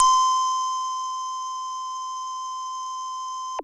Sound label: SYNTH Bloomy Higher High